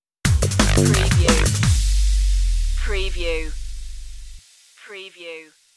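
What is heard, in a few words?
danger drum and bass stinger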